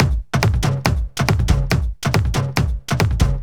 Kick Loop, 140bpm

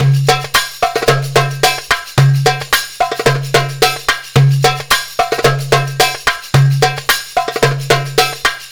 PERLP, ArabianTraditions

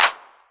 Clap, Novation Drumstation; Novation DrumstationV1

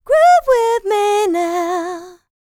LICKS, DANCE; R&B & Dance; ILIO; Vocal Planet

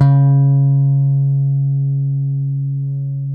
C#3, Bass; Masterbits CLIMAX 7 - Bass; KEN SMITH BS

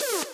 Synth shot A#; VEC5 Multi Loops

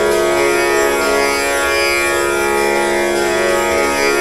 TAM SIT SAR

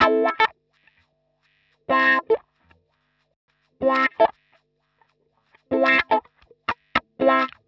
125 X wah A, wahwah; PowerFX - Nu Jazz House

